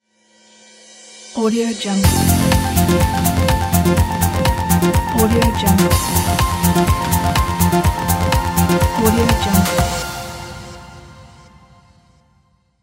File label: tv intro ident